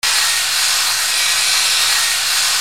BATHROOM

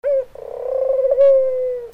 BIRDS

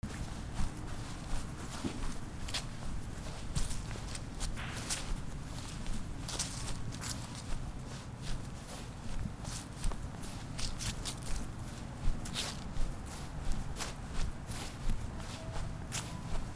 FOOTFALL

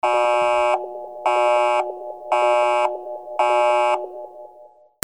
BUZZERS

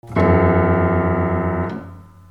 MUSICAL

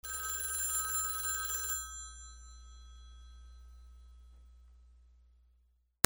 PHONES

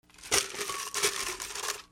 MONEY

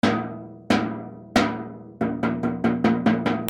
RHYTHMIC

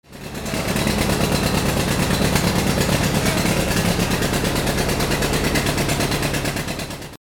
CARS

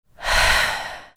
PEOPLE

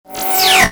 WEAPONS

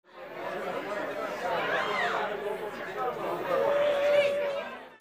CROWDS

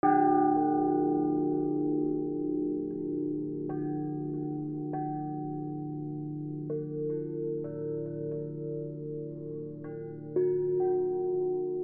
BELLS

